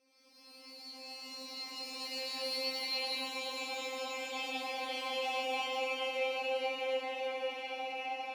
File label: Sitar C4; Secrets